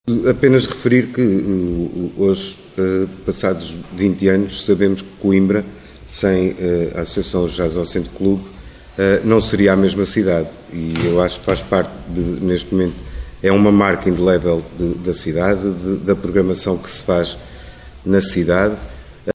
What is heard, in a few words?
JAZZ